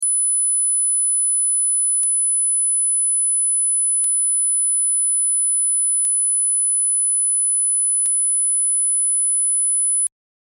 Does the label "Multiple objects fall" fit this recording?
No